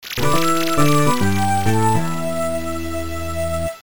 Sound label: Celebration Theme